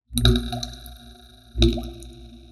Big Water Drop Mix

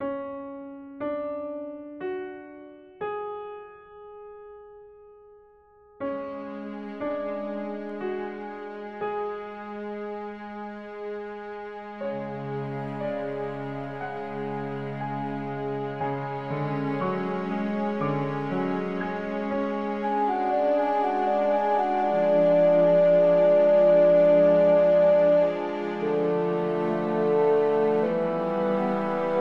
Segue

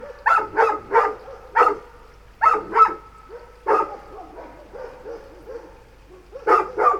Dogs

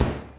Bass Drum

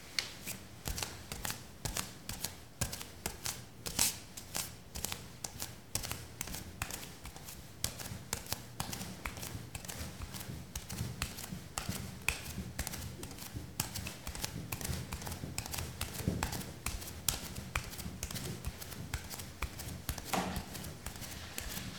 Walking Barefoot Stone

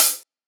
Hat

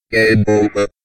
Game Over; Irrlicht

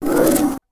Cheetah

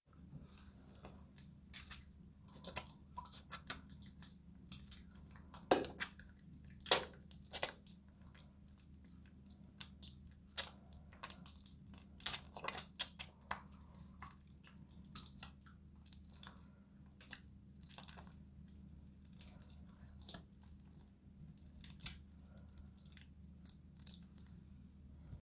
Wet Lotion Rubbing

